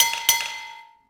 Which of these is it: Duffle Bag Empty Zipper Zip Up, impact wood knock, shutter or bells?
bells